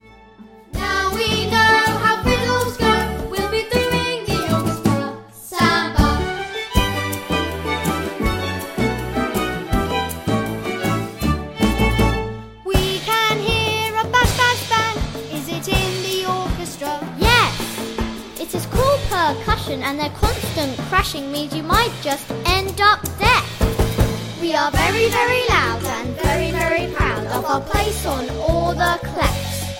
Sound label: Orchestra Samba